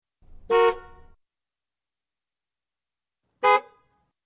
Car Horn x 2; Sound Ideas; Series 2000

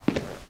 footsteps squeaky; Apotheorasis

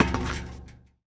Street Ball Metal Basket; SFX Kit; Street Basketball

